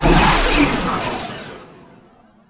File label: Torpedo; ImpGal2; spacewar; weapons